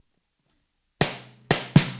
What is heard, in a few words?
Drums Intro, 70s Analog Funk; Sonic Foundry (Sony Creative Software); What it Is! 70s Analog Funk